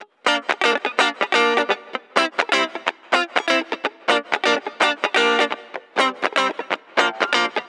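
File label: guitar, disco; Best Service ProSamples vol.48 - Disco Fever; AKAI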